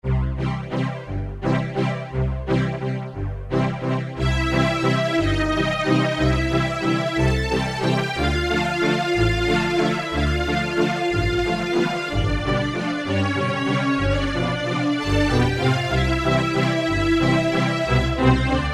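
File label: Double Strings, Roland U-110; Factory Patch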